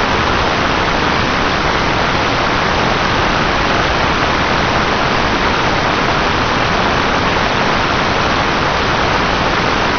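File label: stationary noise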